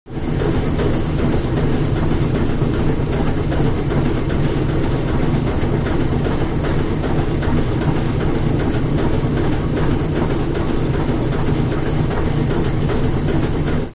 industrial machine, ambience; cstrike

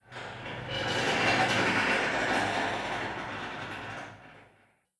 metal rattle; cstrike; cy facilitydrop

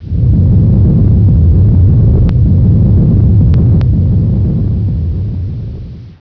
rumble

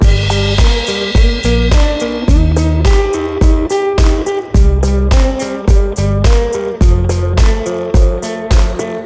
song

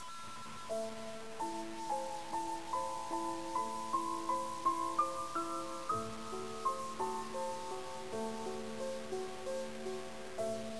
piano

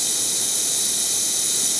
pipe steam loop; ambience; cstrike

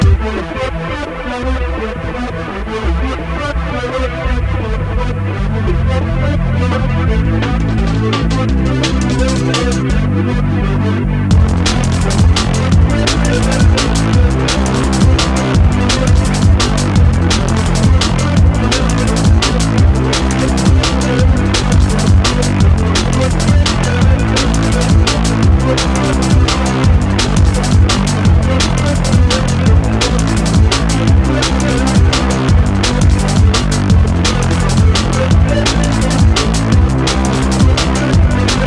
dnb

